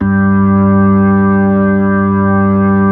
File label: B-3